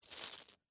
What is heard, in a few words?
Marbles Pick Up, Texture, Marbles, Pick, Up, Handful; Miscellaneous Toy; BlastWave